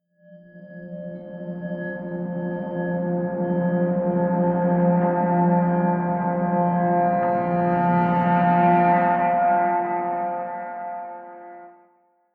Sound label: fading drone bell